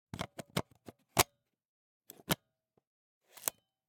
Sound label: Camera, Pentax KS2, DSLR, mechanical, removing lens, replacing lens, 3 moves, XY; Eiravaein Works; Vaeyan IV